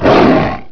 swipe; gug; quakemapping; mod quoth

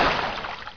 wfend; mod quoth

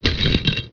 gib chain

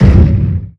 magic; dknight

